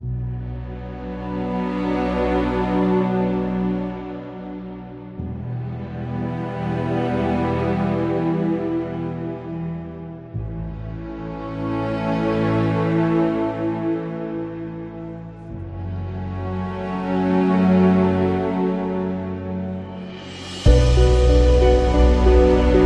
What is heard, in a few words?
Dramatic Documentary